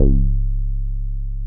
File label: C#1, Bass; E-MU Producer Series Vol. 6 – Analogia Project (CD 1); Boom da Bass 2